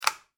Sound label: household switch light flick; Light Switch (Wall Mounted) Single Click; McKinney Sound